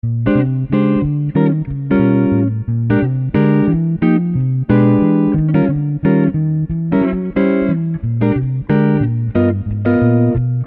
Jazz

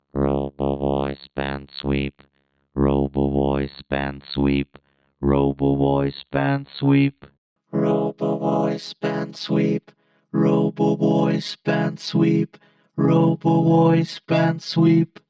EH V256 Robo Bandsweep

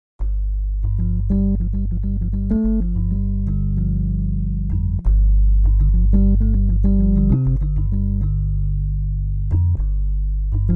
Pianet T

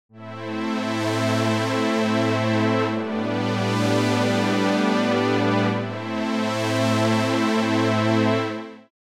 OBXa V Pad

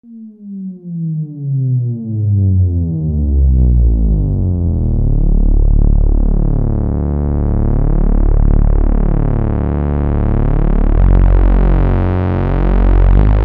deep bass

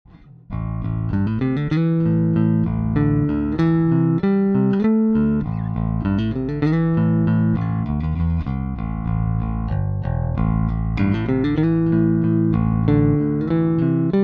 Both full pick

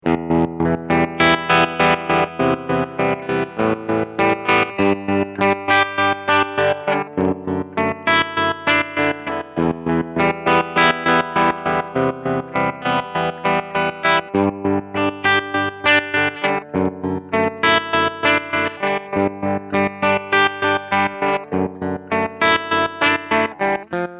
tremolo

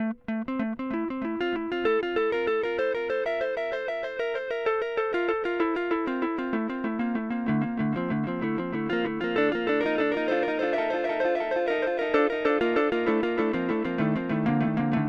Amplitube X Time Ping Pong

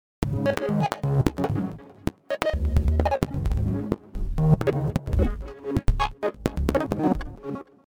KORG MS 20 V Efx Plinky Plonker; Arturia; FRAGMENTS

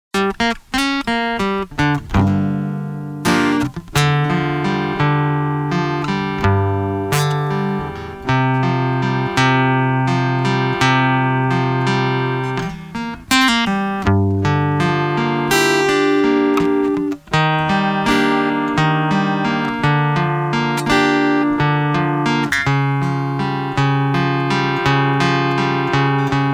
Picking Piezo

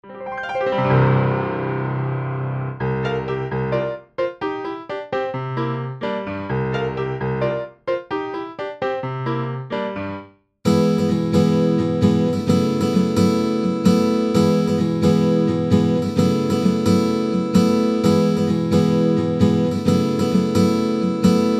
akust sounds

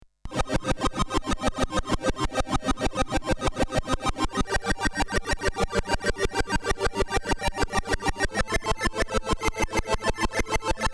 AY3 Osc arp env

